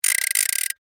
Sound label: Gear Wind, Large, Plastic, Clicks; Foley; BlastWave